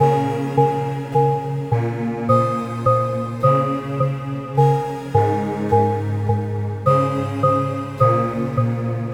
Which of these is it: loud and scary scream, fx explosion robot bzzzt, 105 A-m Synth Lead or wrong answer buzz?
105 A-m Synth Lead